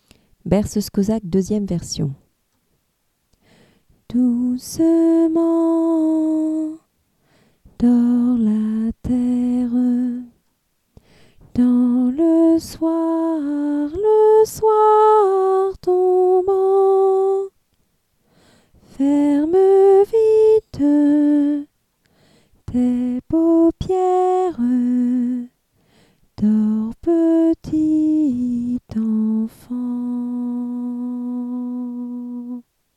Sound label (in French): berceuse cosaque version alto